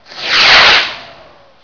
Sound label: whoosh